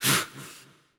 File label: player grunt